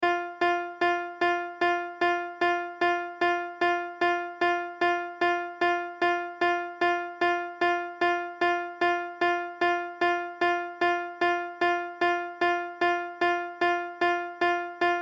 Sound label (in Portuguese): amostra de pulsos com periodicidade